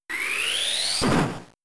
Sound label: Mega Punch; pokemon; gen 3; attack moves